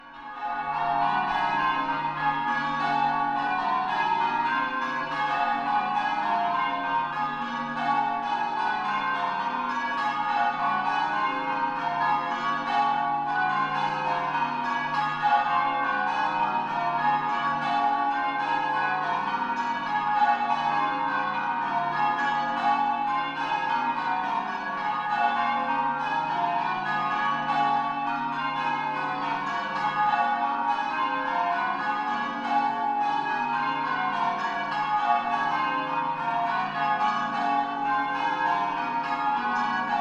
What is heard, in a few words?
bells